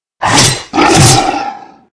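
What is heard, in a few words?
werewolf skill B; langren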